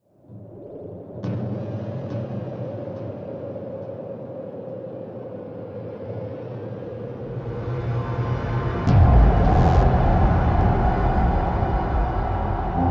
Music Main Menu Intro; It Came From Space and Ate Our Brains

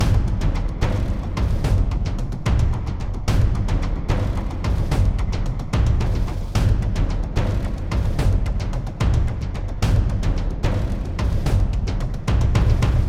Garden ACTION PERC loop

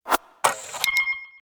Card Timercount

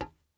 glass step

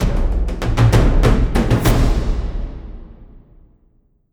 epic drums sound logo

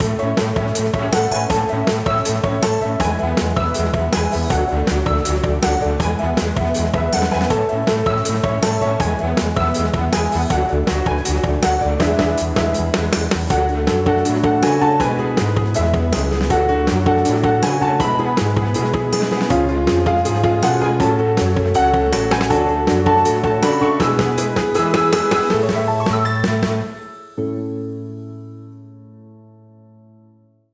Ending Animation Music

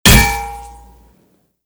bullet hit steel; Shattered Horizon

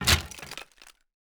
wood break

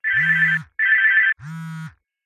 Cellphone Ring (Generic)

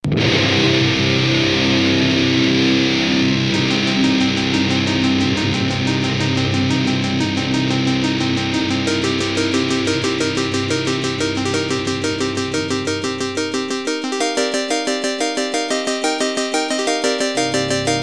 heavy action; the last hunt

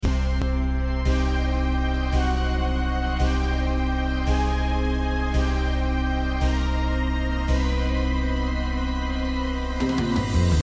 Boss Battle Intro; RPG Maker MV OST

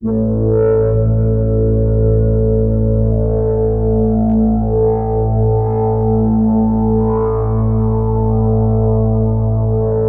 Overlay Drone; Act3 TheView

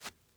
dirt jump

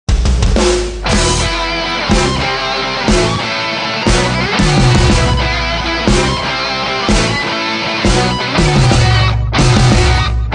Race; the world of cars online; Tailgator Speedway

